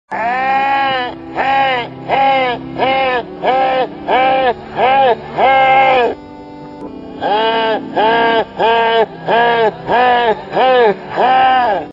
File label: tidus laugh